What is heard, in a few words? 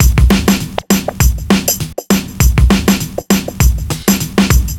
Vintage beat, rock-pop